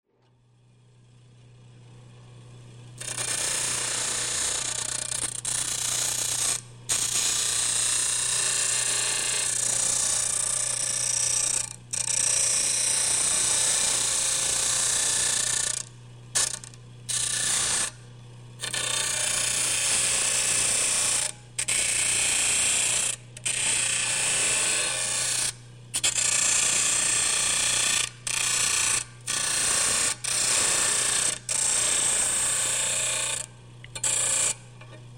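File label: sounds of lathe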